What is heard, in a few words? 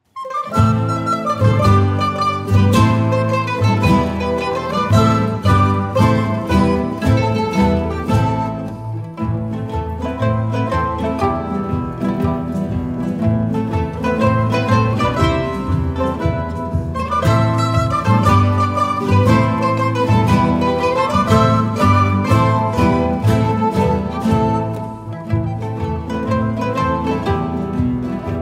celtic fairies, Zupforchester